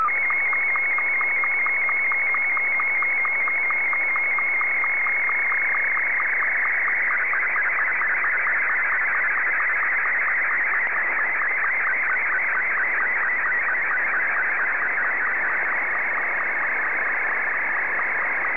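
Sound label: Martin 1, slow-scan television; VK3DFL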